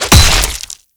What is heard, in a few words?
kill smash